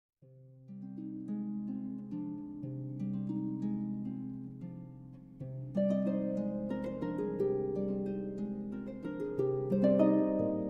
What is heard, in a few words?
Harp, Guitare; Hasselmans